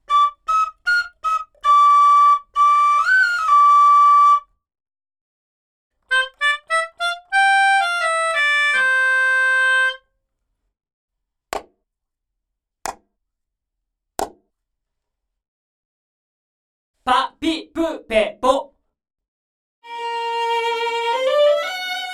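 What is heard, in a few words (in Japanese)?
アイソレーション・ブース：Studio 1, 非常にデッドで、「完全に乾いた音」にわずかな空気感を足す; ライブ・ルーム内にある、楽器やボーカルを分離して録音するための狭いブースです。; 非常にデッドで、「完全に乾いた音」にわずかな空気感を足すのに最適。ナレーションやオンマイクのボーカル向け。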